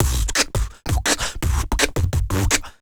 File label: Human Beat Box, Drum & Bass; Loopmasters